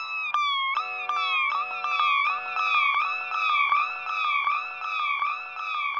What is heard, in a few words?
FX Guitar